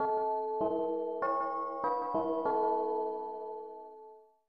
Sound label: School Chime; Last Battle; SNES